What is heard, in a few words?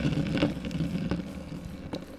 Roll Plastic Soda Cup; SFX Kit; Foley - Misc